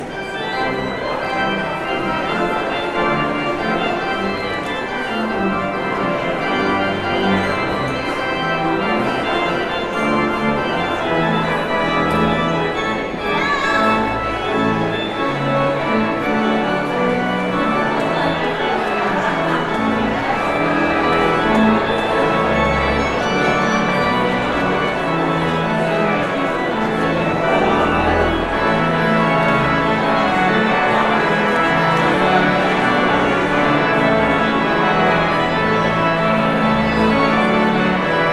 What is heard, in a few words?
Postlude